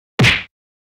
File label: punch face hit; Asian Show Sound Effects